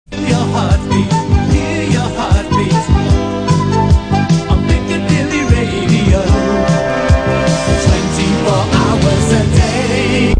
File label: feel your heartbeat